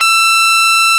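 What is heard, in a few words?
Overdrive Guitar E4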